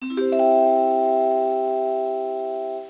chime

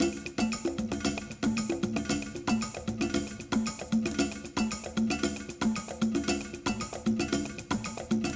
Latin Loop - basic, Drum Loops; Loops for ACID; Cyclotronic Resonator; Quantum Drum Loops

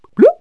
big drip; Wired for Sound Pro CD; Aristosoft, Inc.

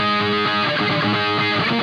Hard Driving Loop, Guitars; ACID MUSIC

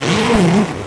SPIN FLAG

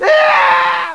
trooper die; Army Men TiS

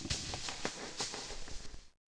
noise

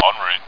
radio; Aminet; Moonbases demo

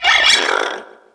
eel hit; DAoC

